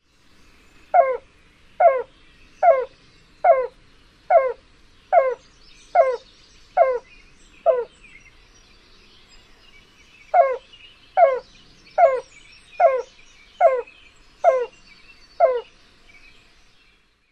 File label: coo song, YEBICU